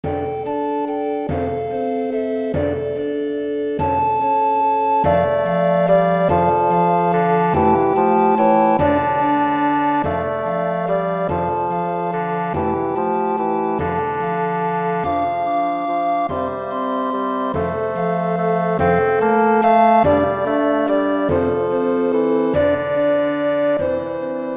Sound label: Tenoroon Track with Melody; We 3 Kings